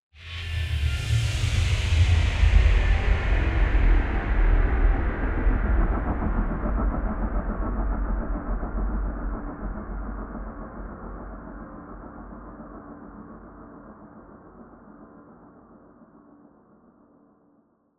Atmospheric Element; MatiasMacSD; Dodge This

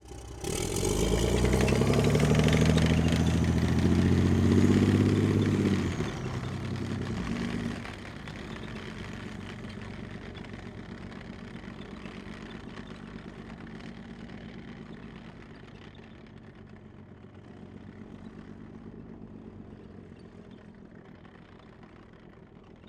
Ikv 91 - EXT - SLOW - Away By Up Stop; Pole Position Production; IKV91 1980; MS Decoded

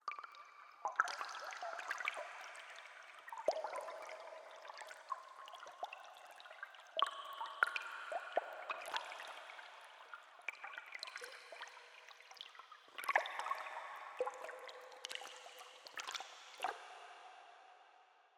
Cave Design; InMotionAudio

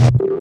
physcannon dry fire; weapons

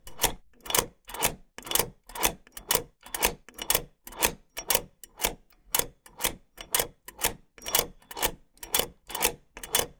Clock Tick Steampunk Loopable Clock 120BPM; Steampunk Mech; David Dumais Audio

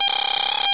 measure; roller; hl2

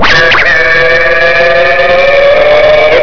houndeye attack; hl2

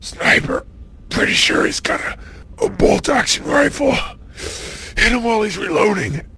hurt; alley; sniper; hl2

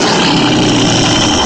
growl low, antlion guard; hl2

